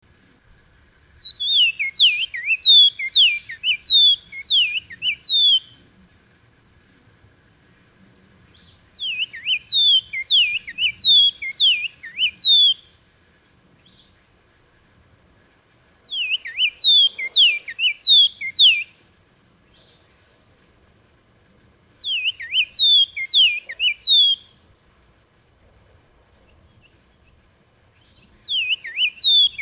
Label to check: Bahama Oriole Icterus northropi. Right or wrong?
Right